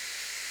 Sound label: Hiss